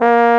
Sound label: BARITON; Best Service; Alpine Volksmusik